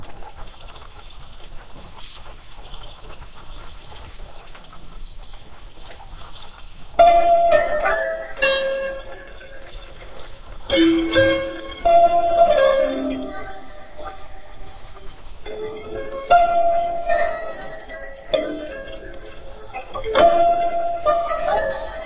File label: calm meditation music; to play while hiking in central highlands of vietnam